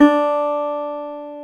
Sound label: GTR, Dobros, National